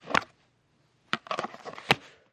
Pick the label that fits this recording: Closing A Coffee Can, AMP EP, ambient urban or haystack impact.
Closing A Coffee Can